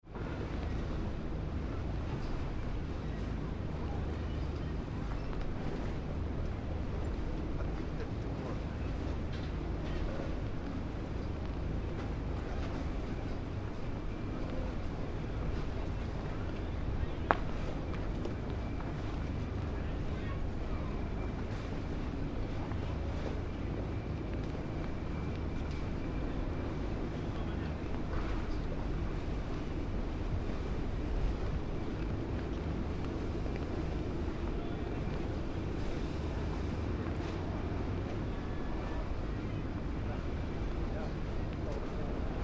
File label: Harbour Ambience Low Level, Fairly quiet, lazy day at harbor; General harbor ambience, few voices, distant traffic.; Crowd Sound Effects